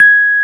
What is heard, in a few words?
G#5, Electric Pianos; Wizoo Electric Pianos